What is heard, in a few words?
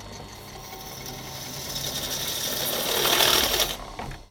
DRILL; 1000fx